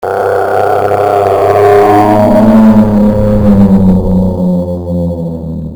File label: cave entrance